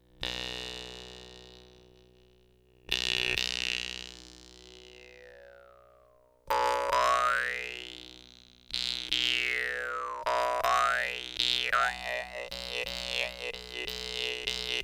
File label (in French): diomine basse B3, guimbardes